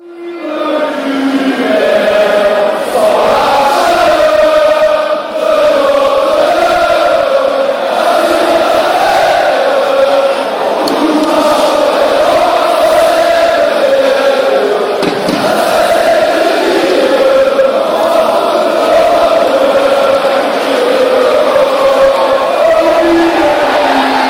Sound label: oh ville lumiere, fan chants